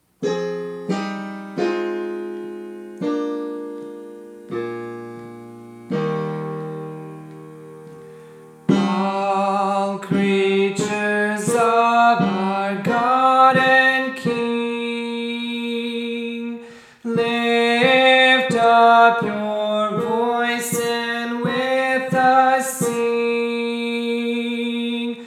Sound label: Tenor